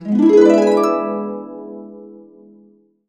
E, Complete Harps; USB Soundscan vol.62; AKAI

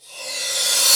Reverse Cym, Cymbals

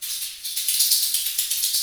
metal jangle, perc fx; Roland LCDP14 Africa VOL-2

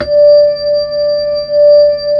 B-3 Hollow; Xfade; Keyboards of The 60's and 70's

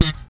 POP D#, bass; Spectrasonics; Bass Legends CD 1

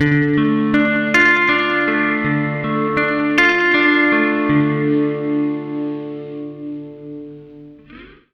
80 MIN ARP D, Acoustic Guitar Loops; USB Soundscan vol.04 - Electric & Acoustic Guitar Loops; AKAI

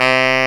SAX TENOR; Tenor V-sw

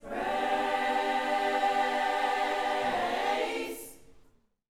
Vocal, Gospel, PHRASE; Spectrasonics

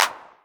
909 Clap, Novation Drumstation

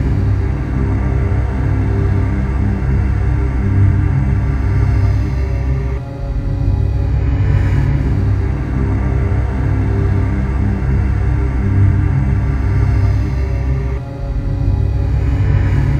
Spectral Groan; Sonic Foundry (Sony Creative Software); Loop Noir

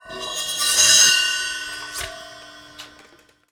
Prepared Drums

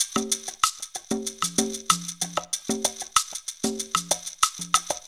PERC LP, PERC LOOPS; Zero-G; Funky Elements

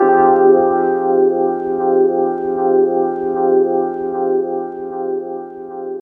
G, Pads; Zero-G; Total Drum Bass

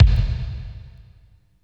KICK, Tekno Industrial; East West

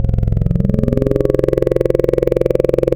Vowel Uwhe, wavetables; Waves Codex

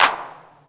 CLAP, Pure Electro, DRUMS; USB Soundscan vol.03 - Pure Electro; AKAI

